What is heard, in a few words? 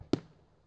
PERC COMBI; Crypton; DrumBase5000